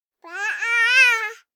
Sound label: BABIES TALK Girl 12 months Bahaha; Baby Boom